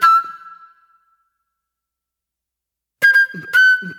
Soul Plate Flute

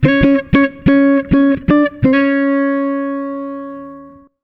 FK SOLO, Jazzistic; FNK

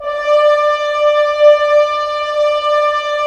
Horns; Roland - LA Composer vol. 4

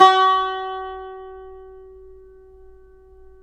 CUMBUS F#, Guitars; Spectrasonics; Hans Zimmer Guitars VOL-2

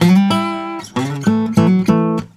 Blues Guitar lick; ILIO; Fretworks; 96 HIRUM LICK

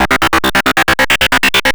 GRUNGE

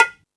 Latin Drums, E-MU Pro-Cussion; drum machine

